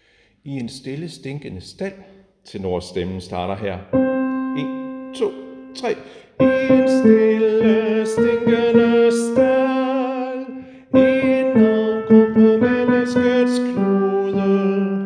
tenor; harlev koret; I en stille stinkende stald

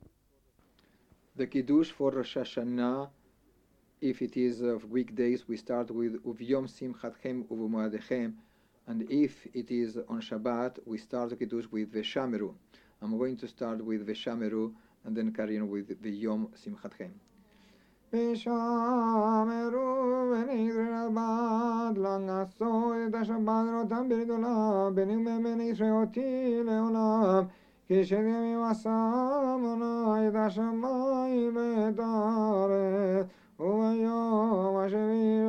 Kiddush, Sephardi; Rosh Hashanah Musaf; New Year musaph

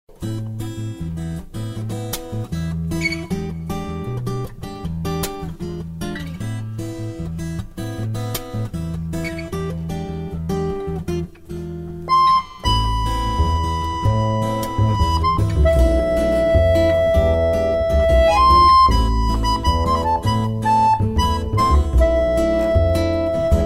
Bossa

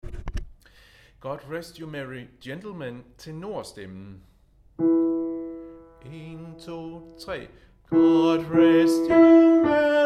tenor; God rest you; harlev koret

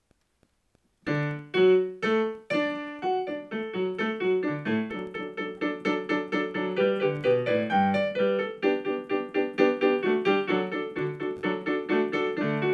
Sanctus Piano Only; practice recording; 2019 Honors Chorus Practice Recording; North Carolina Elementary Honors Chorus

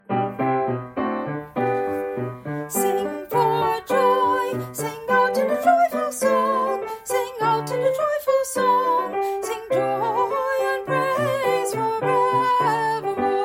Sing For Joy Audition; North Carolina Elementary Honors Chorus; practice recording with vocals

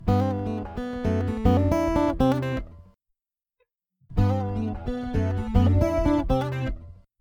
chorus

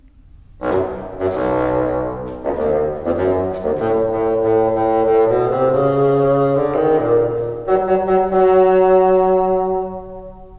Grandpa Bassoon

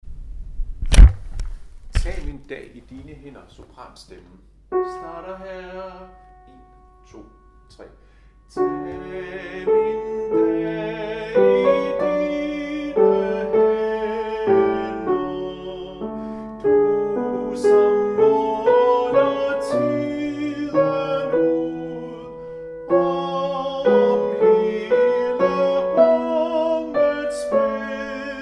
sopran; Harlev Koret

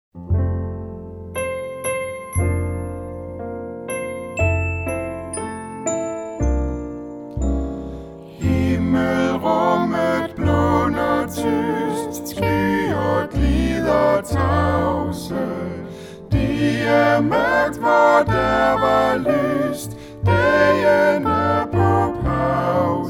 SATB

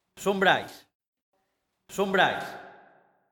reverb

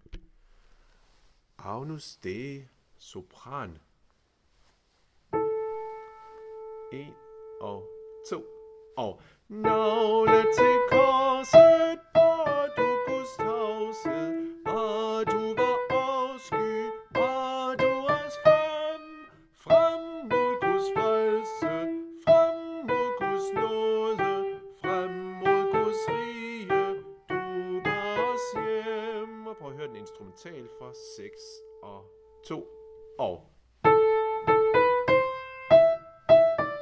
Agnus Dei sopran; harlev koret

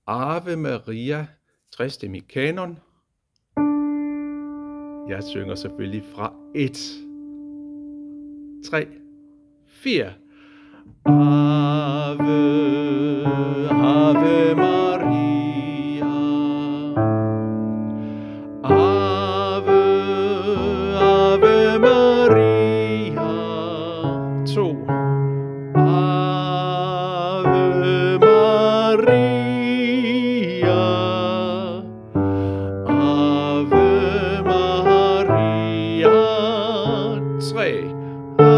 Ave Maria kanon; harlev koret

